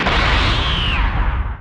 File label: power down